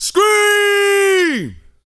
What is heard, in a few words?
VOCAL, R&B Dance, HIP-HOP